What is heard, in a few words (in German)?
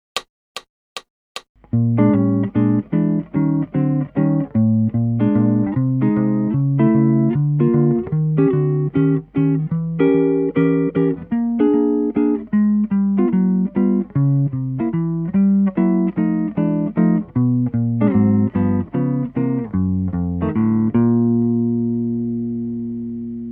Jazz Blues, Gitarre